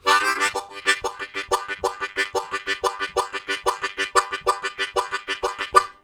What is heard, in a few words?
Harmonica, WAV Riffs; Zero G